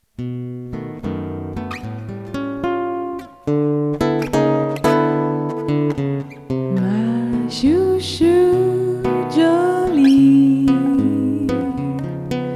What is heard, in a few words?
soft and swing